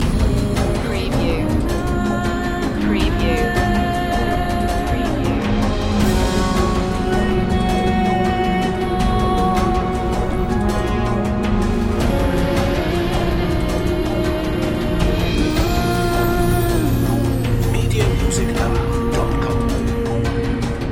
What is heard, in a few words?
miracle voices loop